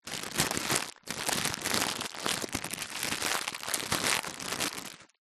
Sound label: food packet noodles dry handling